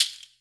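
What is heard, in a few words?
shaker perc; drumkits; percs; fl studio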